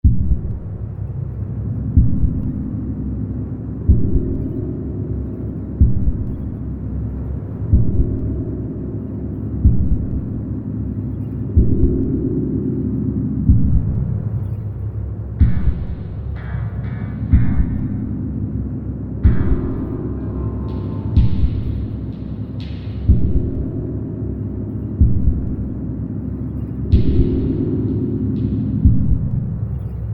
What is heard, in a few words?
Ambience Horror; Jolly 3 Chapter 2